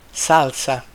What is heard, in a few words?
salsa